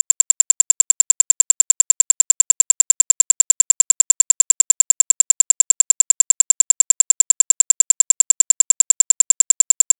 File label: burst; noise